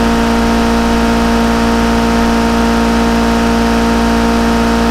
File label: high, vehicles; jopolestar; nfs heat ganimator pack